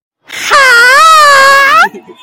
hiiiiiiiiiiieeeeee

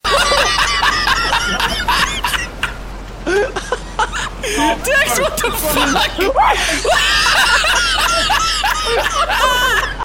hahaha

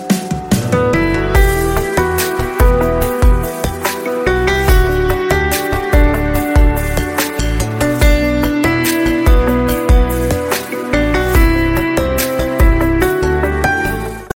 romantic soft

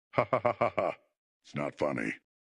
laughing; its not funny

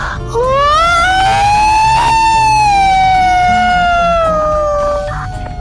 siren; bomba fluffie

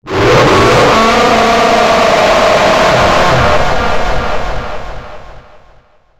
jumpscare sound

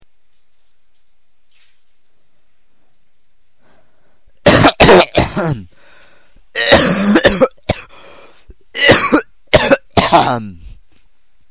cough

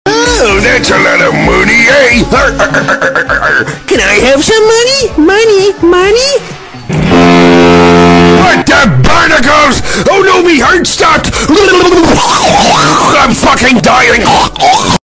hydraulic press money; mr krabs

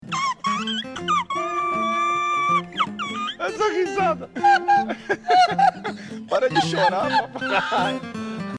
wheezing

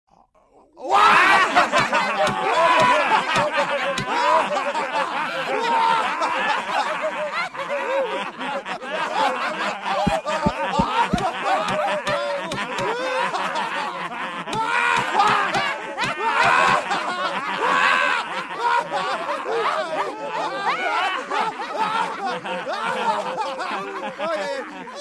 cast laugh; 31 minutos

